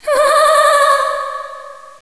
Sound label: cat